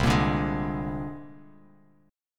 C 7th Suspended 2nd Sharp 5th C down Bass Standard; C7sus2#5 strummed; JGuitar